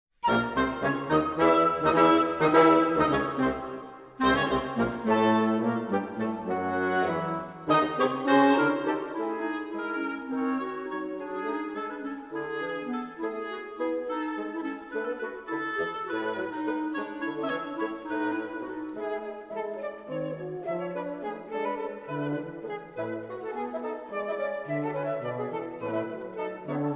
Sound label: Woodwind Quintet, Lively